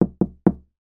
household door wooden internal knock x3; sound effects one

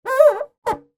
household finger squeak rub on polished table

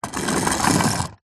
toy train wooden roll wood floor short

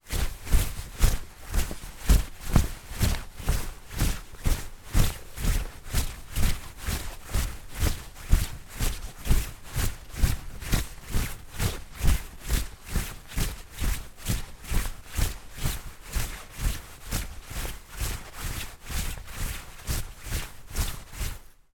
foley clothing shorts sports movement walking

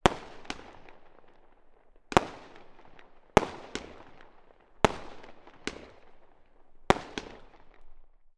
fireworks multiple explosions; sound effects one

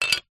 leisure toy plastic brick small set down table

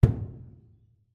impact plastic hollow resonate large

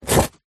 card cereal box rip; foley

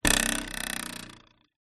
cartoon twang spring; zapsplat

